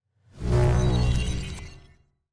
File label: transformation effect; loki; soundscrate